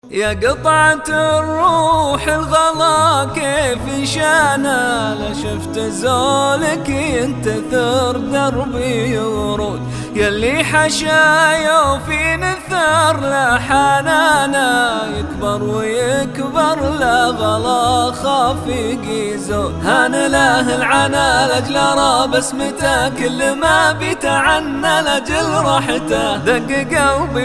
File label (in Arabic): اناشيد اسلامية